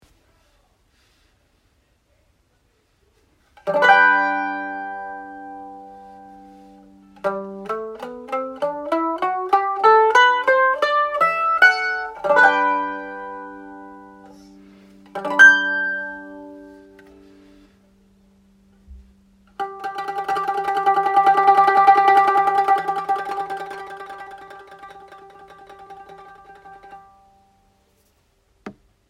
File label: mandoline; solisten-mandoline